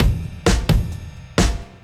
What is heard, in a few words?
Beat Style A 130, breaks; 130bpm